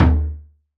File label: Tabl Noueri Dom, Percussion; Roland BK-5